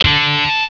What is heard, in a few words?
ClikTwang